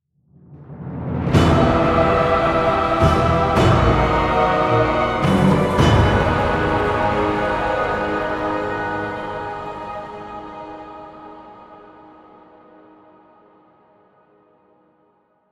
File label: lose; radiant